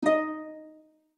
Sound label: Orchestral enter application grid; Nokia; E72; Audio themes